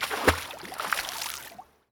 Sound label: water splash